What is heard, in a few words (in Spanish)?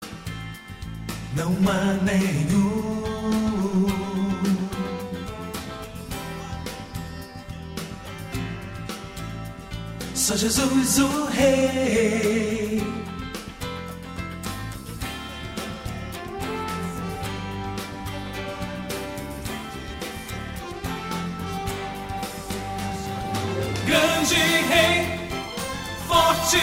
Su Nobre Es Cristo Tenor; cantata navidena